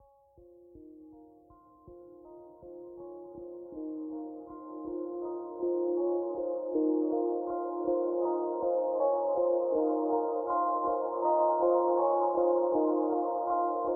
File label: Conversation Loop; Deus Ex Mankind Divided; Koller